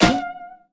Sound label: Wood Damage; Bad Piggies; Android